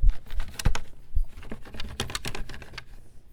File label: Rattling Cheap Wood; BB Retail Therapy Sample Pack